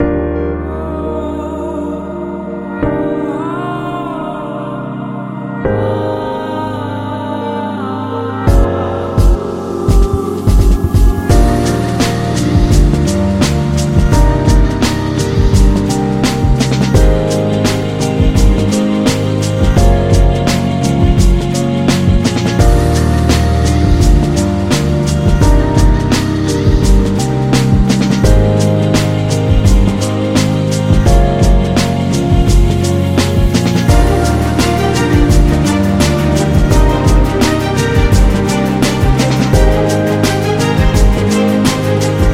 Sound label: Lounge Hip Hop